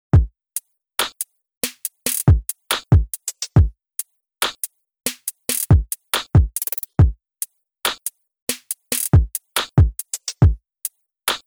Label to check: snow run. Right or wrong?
Wrong